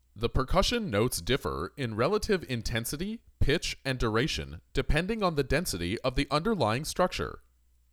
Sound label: Percussion